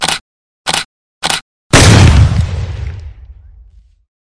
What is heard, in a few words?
click fire